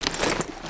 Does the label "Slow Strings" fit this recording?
No